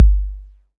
warm sounding kickdrum g key, Royality free bass drum single shot tuned to the G note.